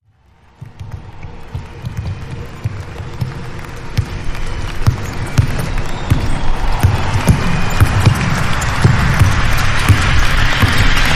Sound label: Court Kings Gamer Rise